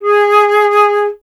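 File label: flute G#; Miroslav Vitous; Solo Instruments VOL-2; Solo 2 Bank 1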